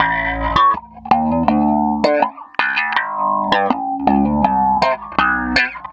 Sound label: Processed Slap Bass, Electric Bass; Sonic Foundry (Sony Creative Software); Leo Cavallo Bass Taster